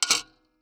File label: PayPhone